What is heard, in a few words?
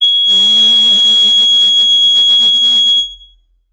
flute acoustic